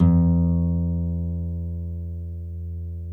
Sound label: NYL NV F#, nylon no vib guitars; Spectrasonics; Hans Zimmer Guitars VOL-1